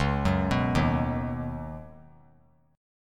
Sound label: Db 7th Suspended 2nd Suspended 4th; JGuitar